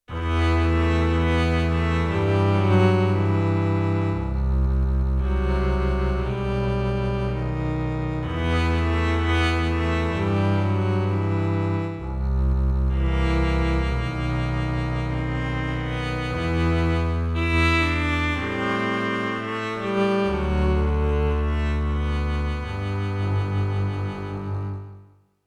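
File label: High-Cut filter, Contrabass & Cello; Yamaha TX16W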